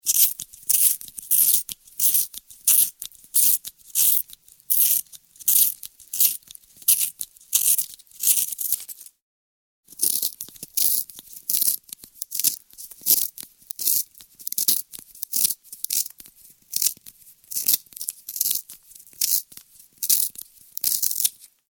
Pencil Sharpener, Grating sound of sharpening pencil.; Offices; Pencil Sharpeners